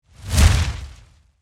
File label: Torch Pass By, Fire, Torch, Pass, By, Heavy, Crackle, Whoosh; Flame Sound Effects; BlastWave